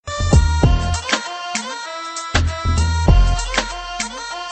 Hip Hop

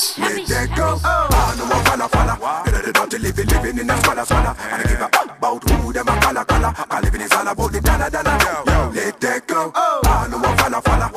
Funkytone

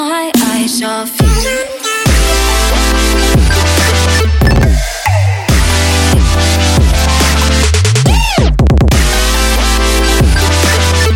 trap remix

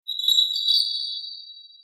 Bell; Zaraki Kenpachi

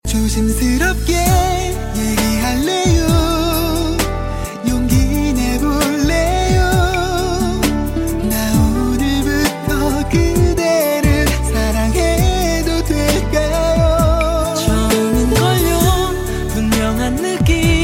Korean Song

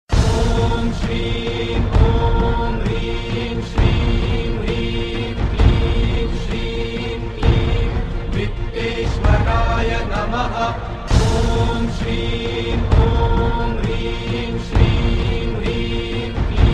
Kuberwealth Mantra

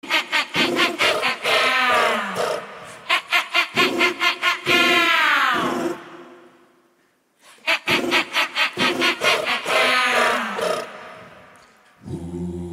telephone

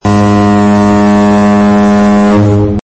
Single Blast Cruise

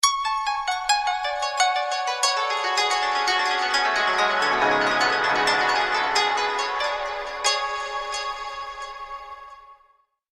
Japanese Shamisen

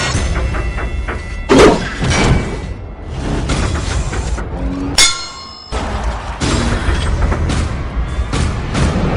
Old Engine

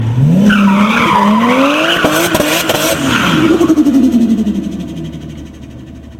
Toyota Supra - Turbo

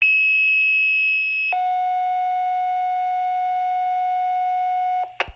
Pipe Creek Fire

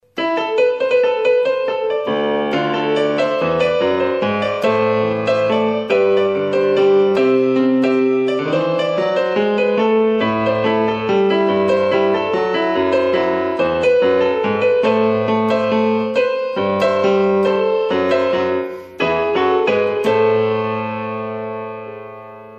Piano improvisation

